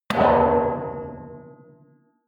metal impact bar bell large

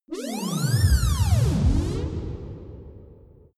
outer space shuttle signals

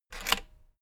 rotary control plastic rattle turn back

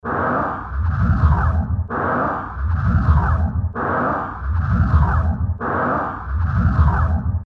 noise pulse backing

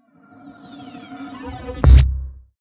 promo pad

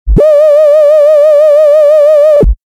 energizing event

